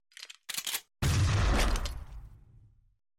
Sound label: shotgun long